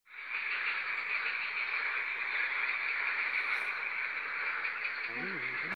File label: Epidalea calamita; Gaëlle-Caublot; Magnac-Laval